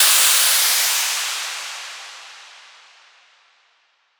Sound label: Techno FX 135; AC2024 - Day 1 - Techno Sounds 2025